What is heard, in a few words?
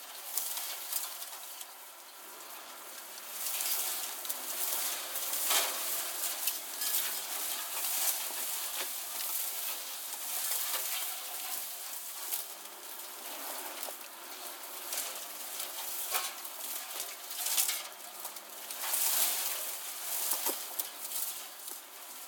dirt plants scrape heavy; Blind Drive